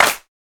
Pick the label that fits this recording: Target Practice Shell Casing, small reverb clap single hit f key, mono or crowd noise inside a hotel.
small reverb clap single hit f key